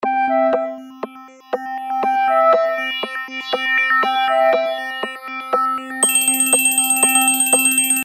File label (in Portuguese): Cuco